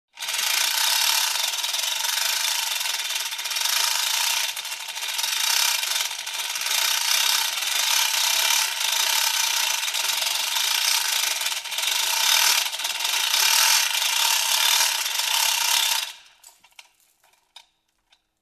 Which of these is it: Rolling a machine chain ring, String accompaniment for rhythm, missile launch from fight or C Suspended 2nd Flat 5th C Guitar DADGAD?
Rolling a machine chain ring